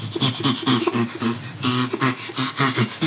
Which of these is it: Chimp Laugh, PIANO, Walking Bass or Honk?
Chimp Laugh